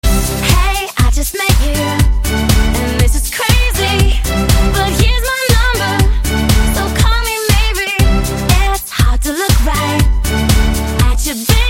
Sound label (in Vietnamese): Samsung galaxy y s5360, nhạc chuông